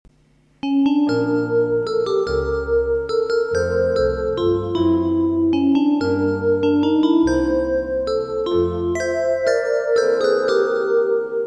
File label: Waltz, accordion